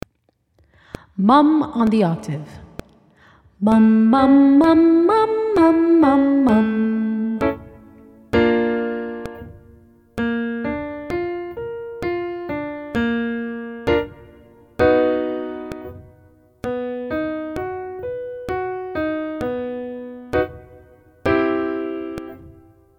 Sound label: mum octave high range, vocal exercises